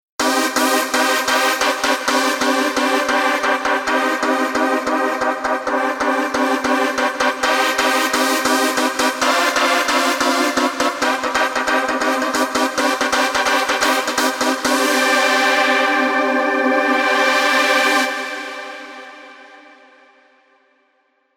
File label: Euro Synth